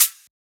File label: Hat; M8; Electronisounds